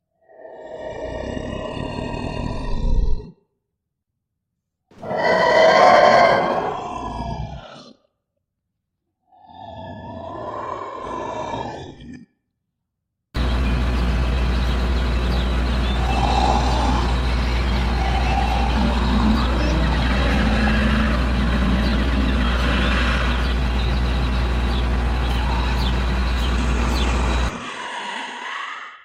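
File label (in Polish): dinozaury odgłosy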